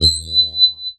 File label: Multimedia Internet CD-Rom Flash Electronic